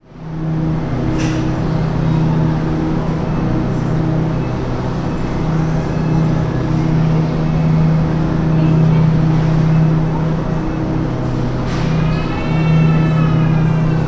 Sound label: drone